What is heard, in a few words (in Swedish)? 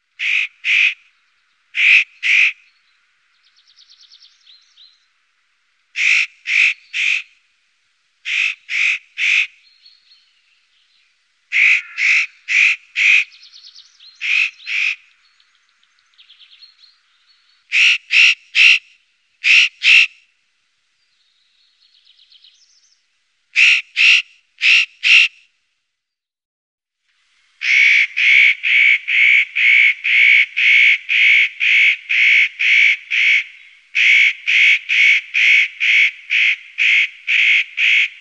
Notkraka